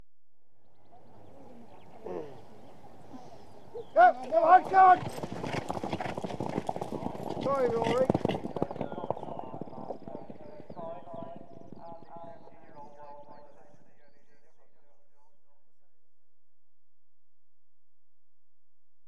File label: Point TO Point Racing, Start Of R, Equestrian Events; BBC Sound Effects Library